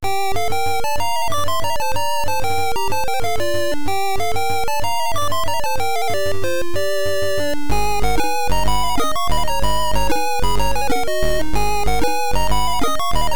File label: Hi Score; Ghouls n' Ghosts